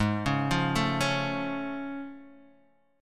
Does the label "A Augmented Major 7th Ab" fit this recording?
Yes